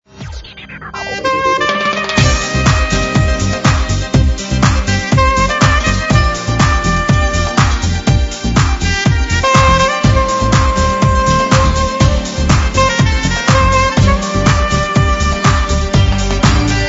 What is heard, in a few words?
Funky Cha Cha